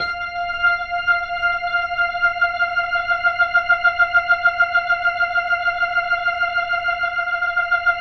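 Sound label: 4F L, Slow Fast Hammond, Organs; E-MU Producer Series Vol. 5 – 3-D Audio Collection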